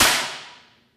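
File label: physics clipboard impact large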